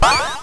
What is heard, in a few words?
Blade Death; Io